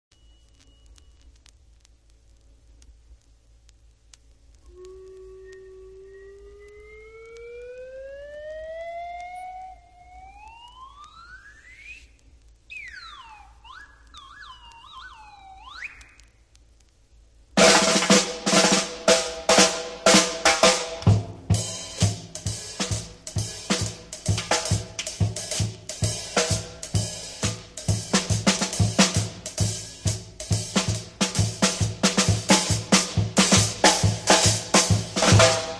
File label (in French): Jazzo flute batterie caisse claire grosse caisse Percussions